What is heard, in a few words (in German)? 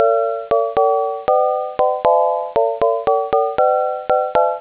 Hymne